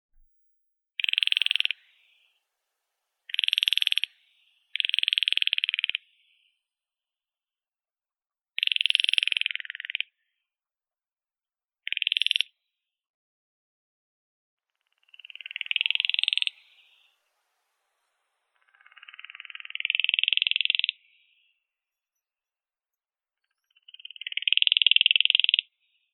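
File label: Baillon's crake, Porzana pusilla; pogonish kroshka; zvuki prirodi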